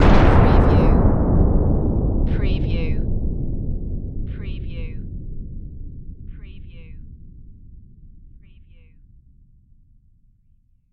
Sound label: Dynamite Distant; Sound Effects Bible